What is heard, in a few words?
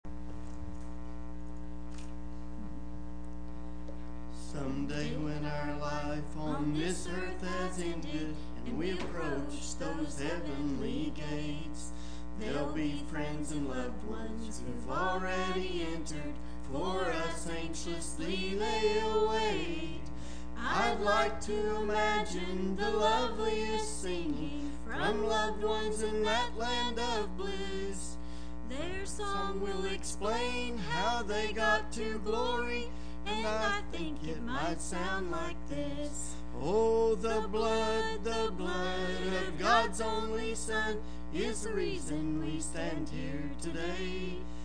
singing Family